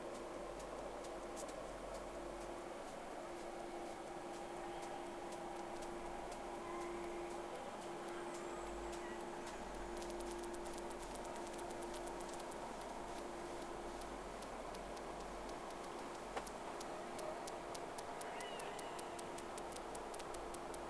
Whales; SeaSnake

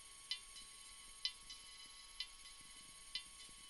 ping

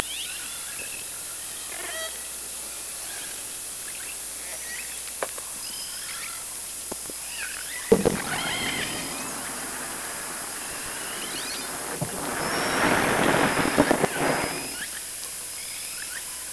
Orca sound Night Passby

